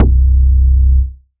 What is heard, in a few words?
Bass C, techno synth shot; Ghosthack; Techno Synth Shots 2025